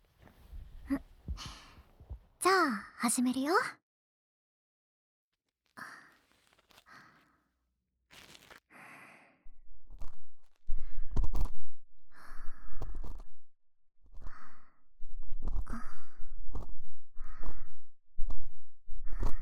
右耳 もう一回！ヤナギの耳かき, ASMR

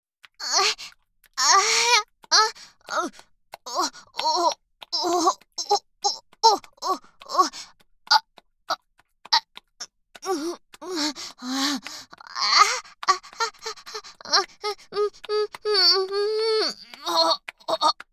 オホ声; SEあり

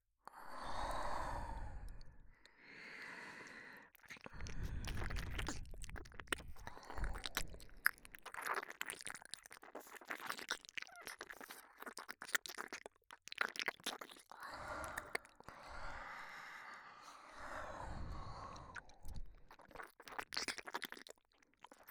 両耳舐めリピート版, ASMR

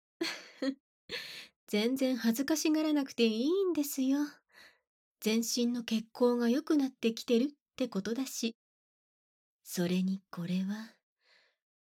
乳首カリカリ耳舐め; 乳首カリカリ耳舐め施術

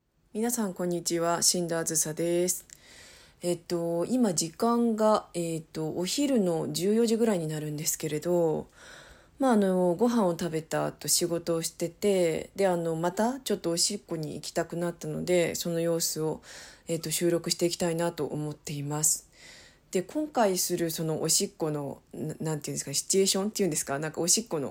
ビニール袋におしっこ

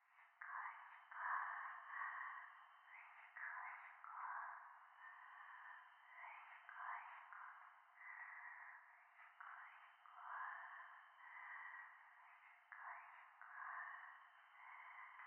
教会 しこしこ 山奥

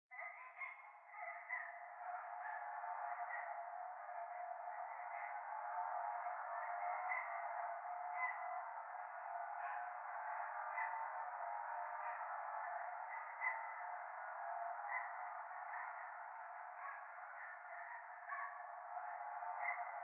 教会 喘ぎ 車の中

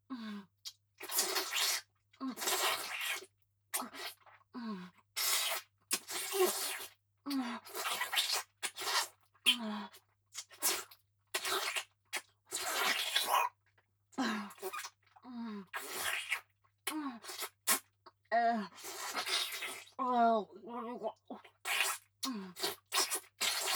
フェラ; ヘレナ

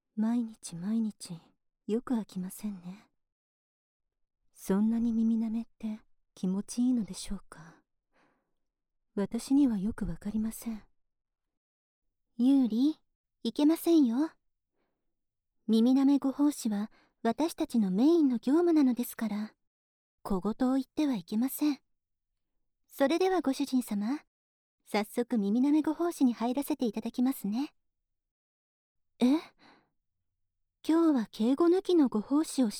耳舐め; 恋人気分背徳タメ口耳舐めご奉仕

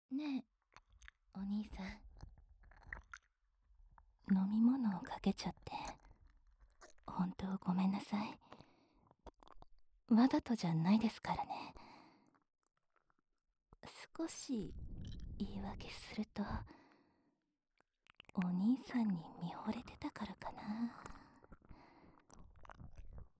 飛行機内で耳舐め 手コキ 手マン キス フェラ される音声

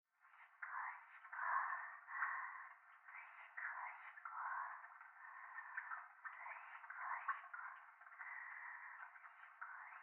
トイレ しこしこ 雨上がり

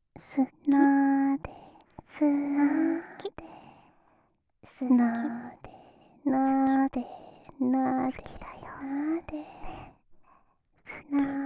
こりこり, ASMR

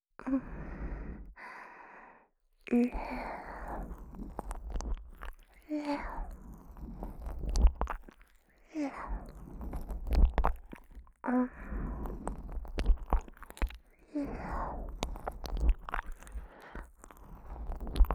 ずーっと耳舐めするだけ 右

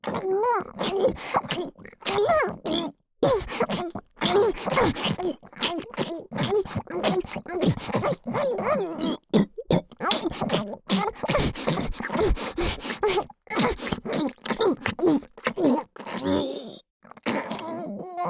ツンデレ 喉奥右耳フェラ, ASMR; フェラ素材

